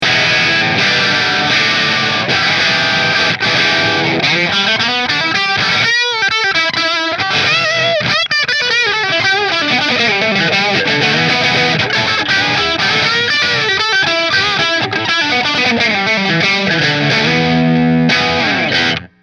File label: Goldtop Bridge Marshall; Hamer